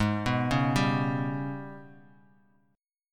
Ab Minor Major 7th Double Flat 5th, down, Bass Standard; AbmM7bb5; arpeggiated